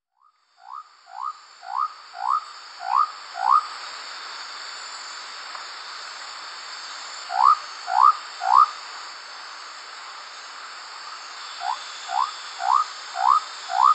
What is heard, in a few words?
Leptodactylus mystaceus